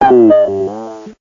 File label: talk